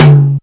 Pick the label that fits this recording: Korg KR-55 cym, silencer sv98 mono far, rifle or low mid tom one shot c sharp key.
low mid tom one shot c sharp key